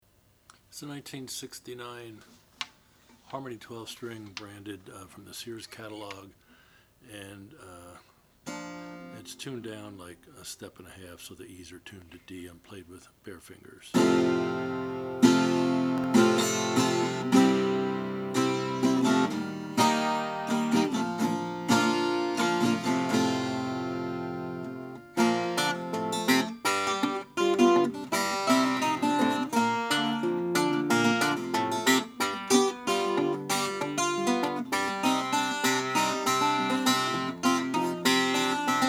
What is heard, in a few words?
harmony sears 1227; 1969; natural